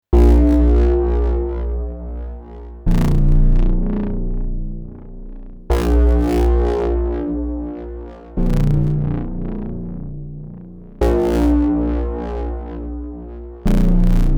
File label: Drone; LAMM RawArtistic PhilKullmann; DRONES EARTH